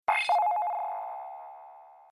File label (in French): alerte